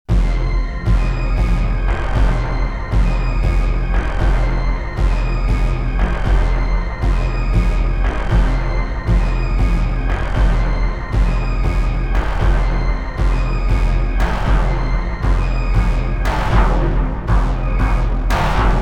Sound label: Sequential Prophet X; Path of Destruction